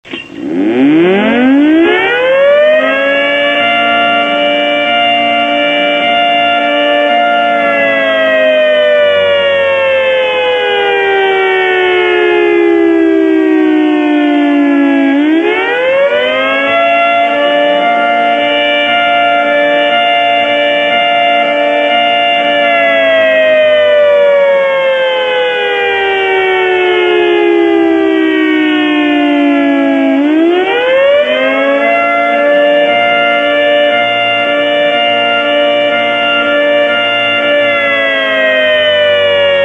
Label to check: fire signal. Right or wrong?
Right